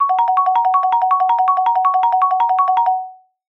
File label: arcade game fruit machine jackpot long